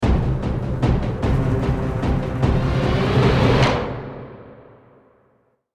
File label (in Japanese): ジングル; 襲い掛る恐怖